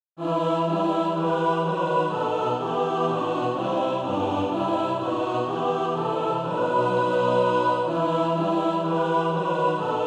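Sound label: mixed, SATB Practise Music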